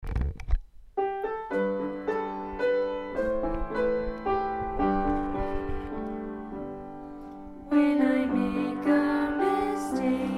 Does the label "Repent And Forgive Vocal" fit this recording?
Yes